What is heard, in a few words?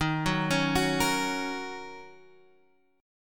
Eb Minor 7th Eb Guitar Standard; arpeggiated